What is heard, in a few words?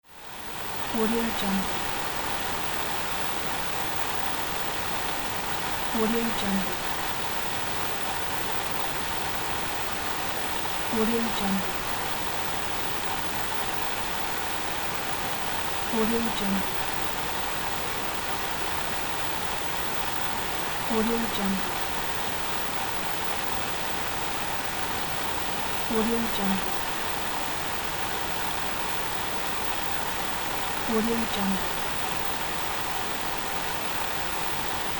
tv static